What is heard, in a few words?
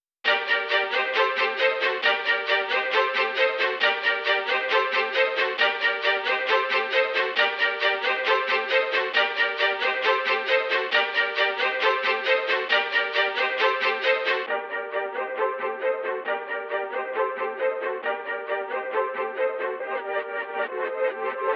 135 BPM G Min String High; Trap; Loop Stems; Cymatics; Opus; 2022 Melody Collection; Trap Pt 2